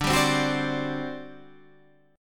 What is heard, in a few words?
G Major 7th Suspended 4th, D